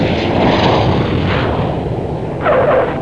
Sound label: landing; 50 Years of Gs and Jets; Digital Ranch; Spectrum Holobyte